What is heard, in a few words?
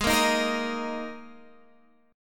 G sharp Suspended 2nd chord